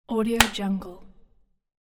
clapper board

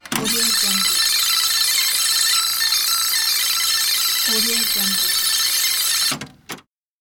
rewinding cassette in deck during playback

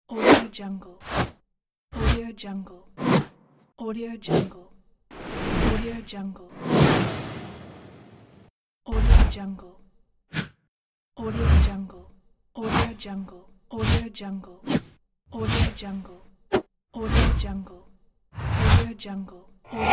woosh pack

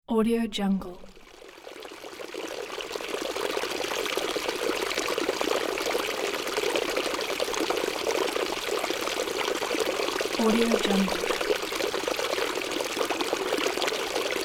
gurgling water stream; worldwideaudio

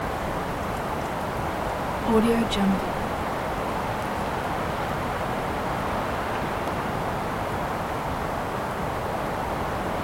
weather wind pine forest treetops slovenia

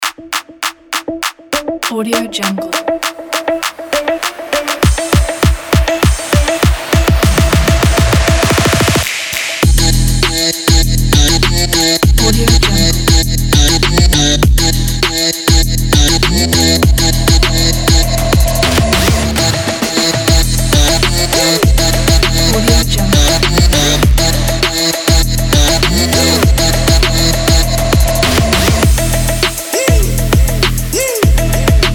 at that trap beat

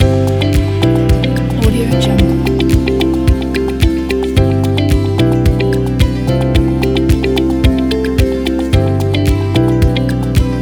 ambient corporate loop

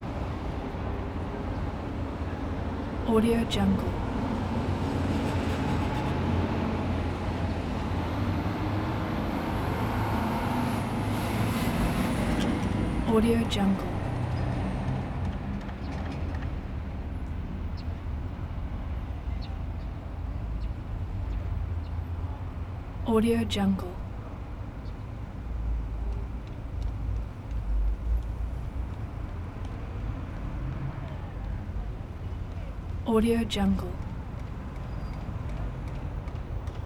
los angeles ambience sunset boulevard; prosoundfx

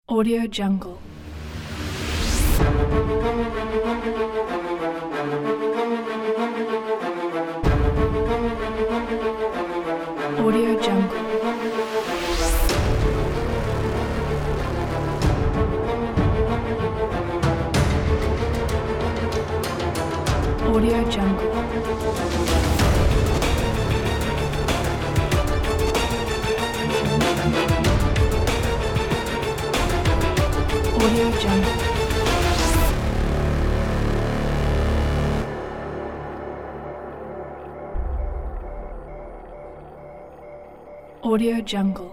action trailer

